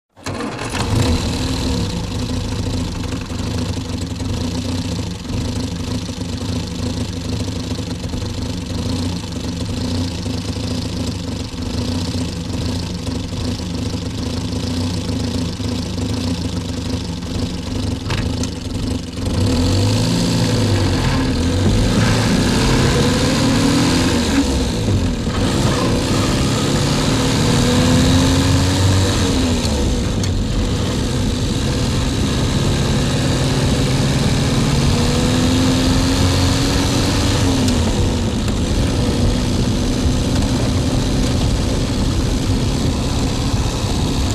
Automobile; Interior; Interior Austin Ruby Start Up, Idling And Away On Tarmac Changing Up Gears To Constant, Then Changing Down Etc.